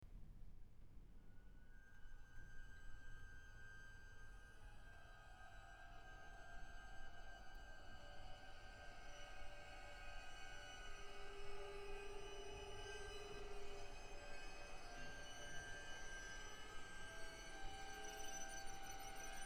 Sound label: Violin Concerto, Per i 24 quarti di tono. Larghissimo